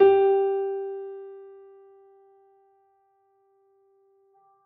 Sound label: Piani G